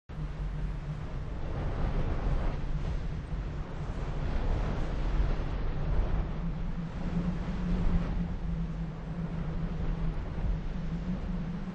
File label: wind; WMBonusXP